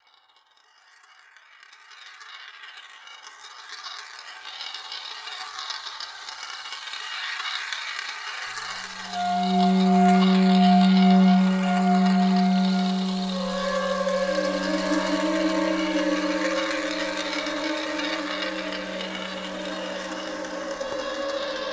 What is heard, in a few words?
abstract clarinet